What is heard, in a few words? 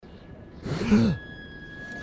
no mask breathing; Metro 2033; gamesoundopedia